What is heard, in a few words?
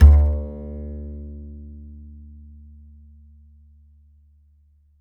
E, E string upright bass; Larry Seyer